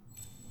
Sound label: Blood Inside